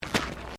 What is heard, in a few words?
Footsteps Concrete Step